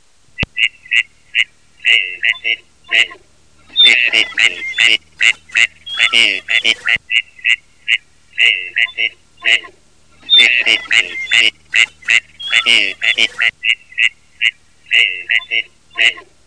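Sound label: grey, ducks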